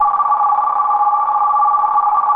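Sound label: telephone; AKAI; FX SIGNALS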